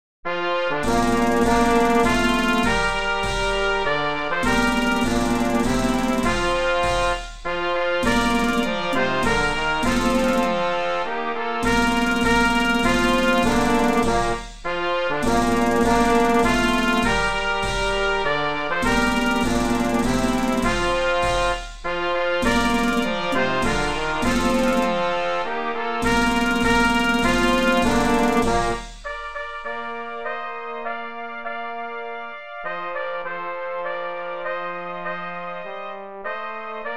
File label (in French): Hymne Americain, fanfare si b; midi